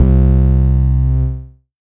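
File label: Synth bass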